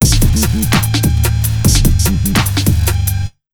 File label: AMBIENT 147, Big Beat Drumloops